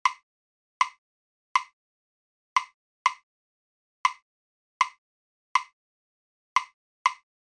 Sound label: Clave